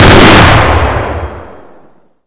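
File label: double barrel, shotgun; m3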